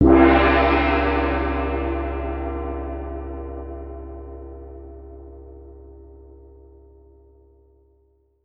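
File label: 36 INCH L, GONGS CYMBALS; Voice Crystal - Steve Reid Definitive Percussion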